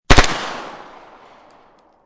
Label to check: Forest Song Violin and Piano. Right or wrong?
Wrong